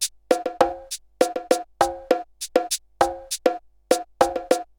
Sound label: RX17 Perc (100BPM), cyborg beats; musicradar